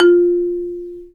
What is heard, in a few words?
Bass Marimba; Roland LCDP11; Africa VOL-1; Af.Marimbas